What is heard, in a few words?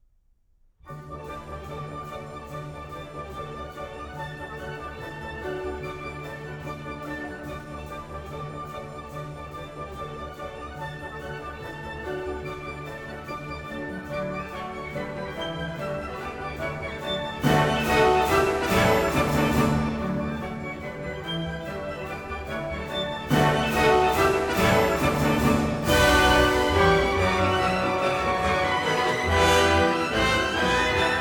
Hungarian Dances for orchestra, D major; Brahms